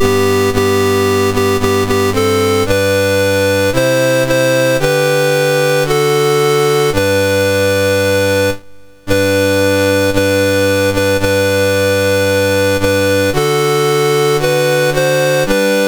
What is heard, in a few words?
Solomon Islands, National Anthems, MOS6581R2; Dave Marquis